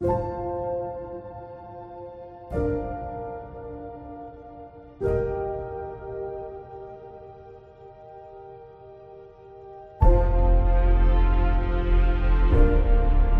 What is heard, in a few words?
Inspiration Piano